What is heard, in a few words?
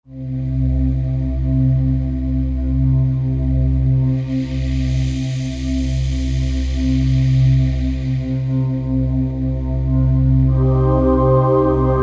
Dark Vox, Roland JV-2080